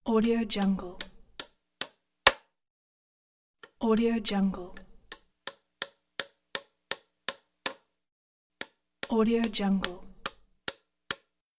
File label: hammer